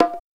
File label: BONGO; drum; PERCUSSION